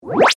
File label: Ascend Deep Sine, Production, Element, Ascend, Deep, Sine, Wave; Ascending Sound Effects; Ascends